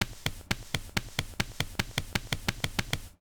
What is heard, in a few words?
Run